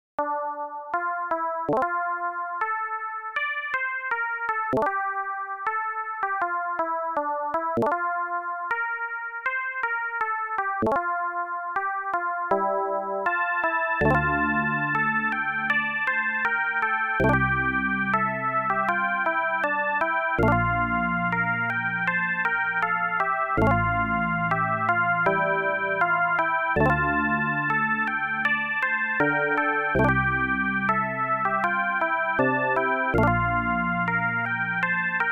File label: BAROQUE